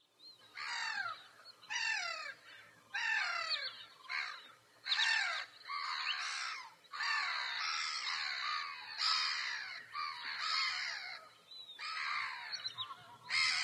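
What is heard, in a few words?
ozernaya chayka golos, black-headed gull voice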